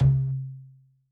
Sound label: MEDIUM TOM, ELECTRO KIT